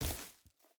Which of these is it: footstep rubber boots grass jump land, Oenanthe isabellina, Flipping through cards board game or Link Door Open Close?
footstep rubber boots grass jump land